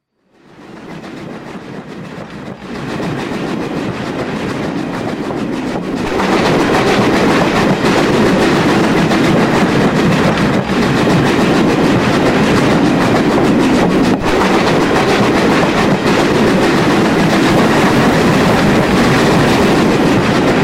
steam trains mix

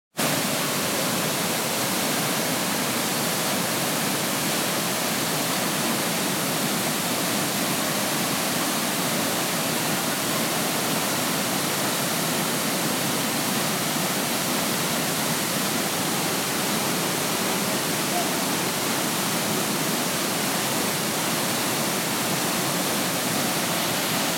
waterfall near Ubud Bali

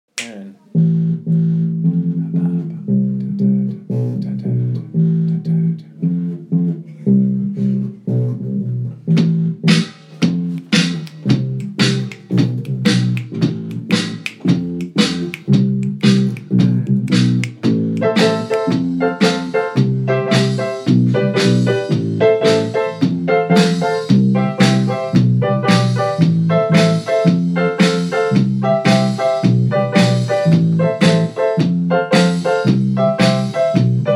riff

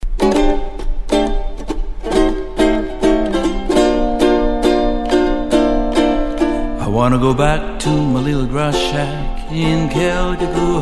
hawaiian music; actionline

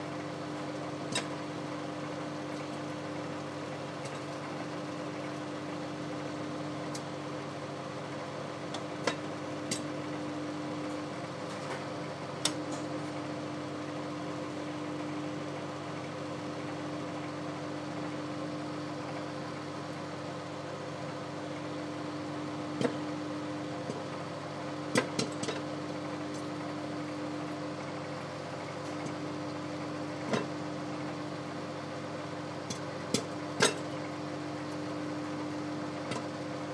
popcorn

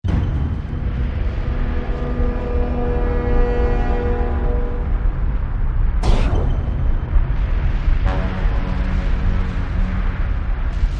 minecraft sound track

